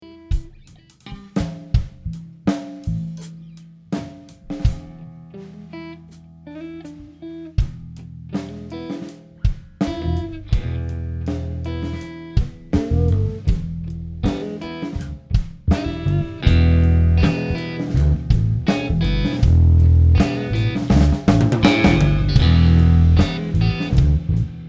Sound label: blues jam again